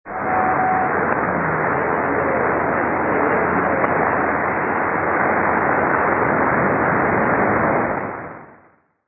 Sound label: 6220kHz Mystery Radio